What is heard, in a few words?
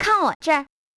cam Ready; KV920